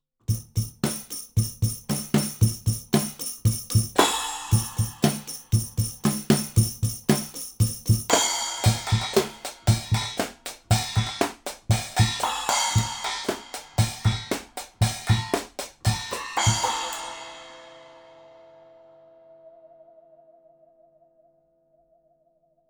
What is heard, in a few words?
Drums R-121 Blumlein